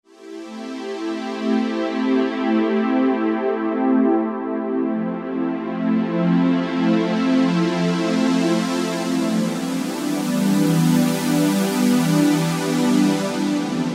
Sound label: pwm strings